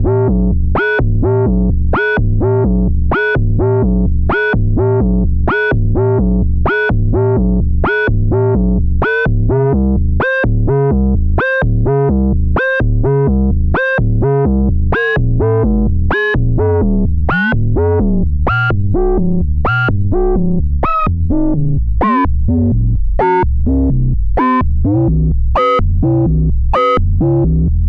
DVCO Ring Mod Seq Glide; macbeth; xseries

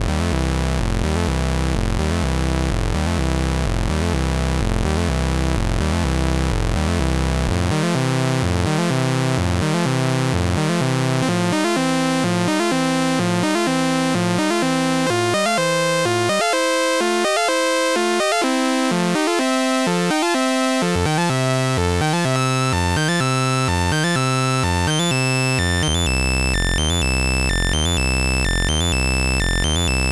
DVCO Ramp 3rds Seq Oct Switch; macbeth; xseries

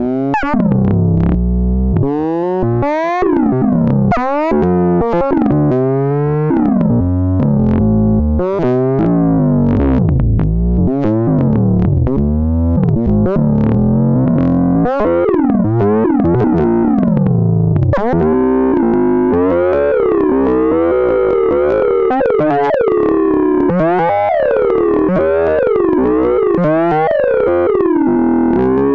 DVCO Sync X-Mod; Model 24; macbeth; xseries

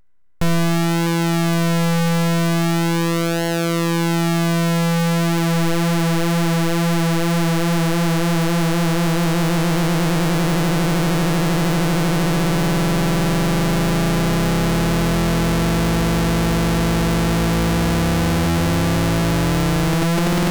DVCO Rect PWM Ext Sine; Model 36; macbeth; xseries

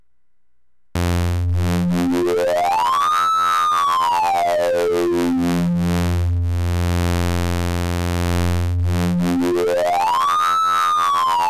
DVCO Sync Ext LFO; macbeth; xseries

